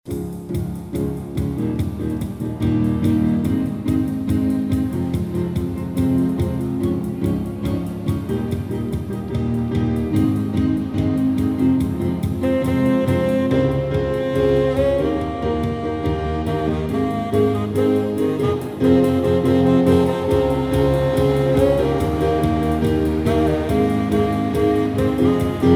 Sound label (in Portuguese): solo sax Piano e Cordas